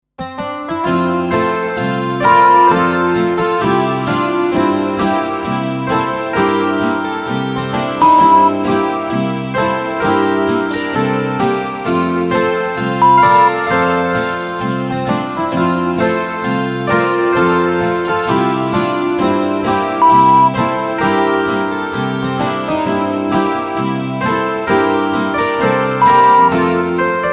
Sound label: Zither Polka 131; Volksmusik, alpenländische, bayerische Musik, Zillertal-Sound, Oberkrainer, Zither